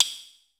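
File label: GATE percussion stick; Crypton - DrumBase5000; GATE KITS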